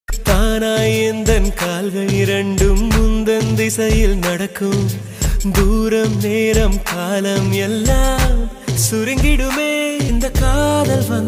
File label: song ringtone tamil love song ringtone; ayan